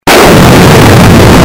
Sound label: nuke